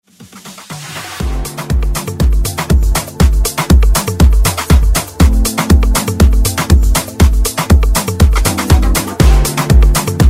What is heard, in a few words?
Afrobeat Redrum